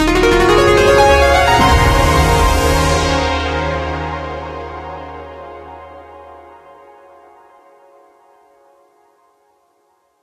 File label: suit fanfare; Tumbleseed